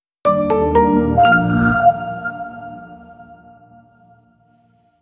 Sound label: Soundlogo; kit dab